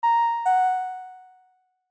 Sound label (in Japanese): pinpon